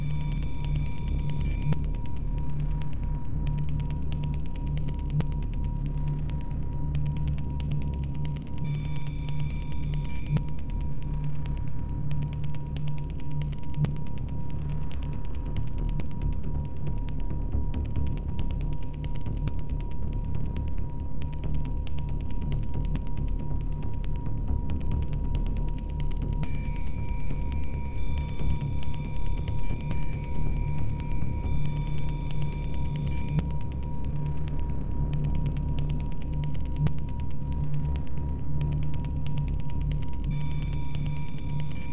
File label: Subway Music Drone High Tempo; Battlefield 3